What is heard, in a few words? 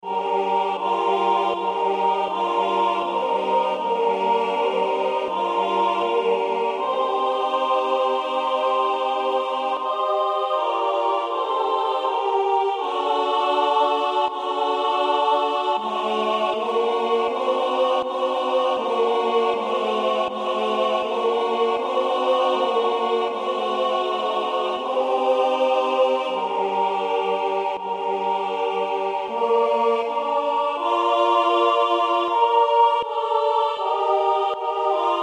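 female; Chertog Tvoy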